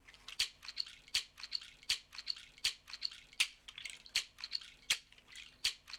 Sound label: music main game shaker; netent